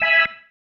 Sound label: Stab One Shots, Organ